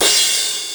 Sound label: TR909 CRASH; East West - Global Sounds